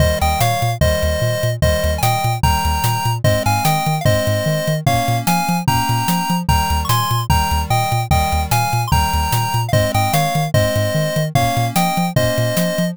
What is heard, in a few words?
bgm street